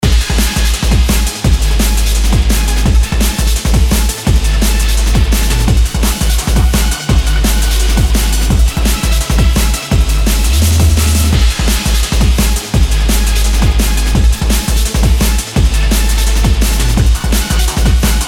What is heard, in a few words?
ebm n bass